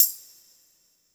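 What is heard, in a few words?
tambourine wet; InVision Interactive; Percussion Slam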